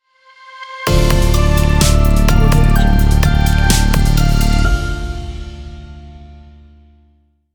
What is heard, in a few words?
technology logo reverse